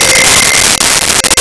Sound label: Crystal C5; Nintendo; July 2020 Gigaleak